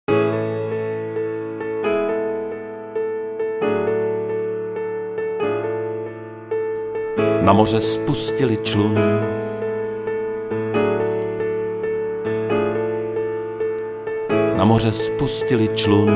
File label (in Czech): klavir